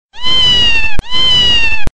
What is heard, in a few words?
mewing cat